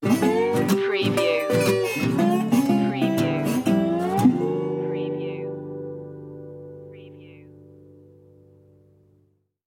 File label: star spangled blues stinger